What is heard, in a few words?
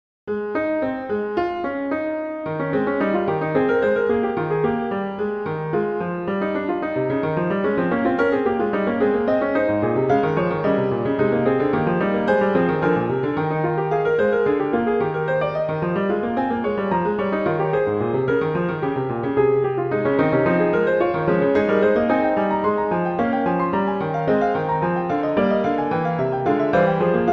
Fugue; Bach; Prelude Fugue XVII